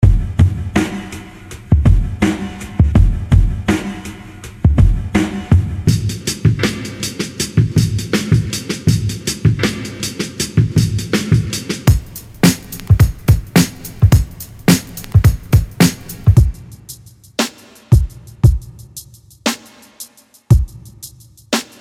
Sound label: Boom Bap Beats